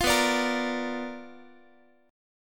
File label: B Minor Major 7th Flat 5th Guitar; BmM7b5 strummed